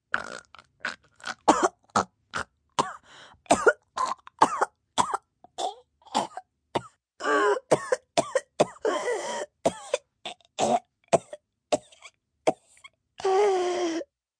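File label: Choke Coughing female, Choking, female, coughing, gasping, gagging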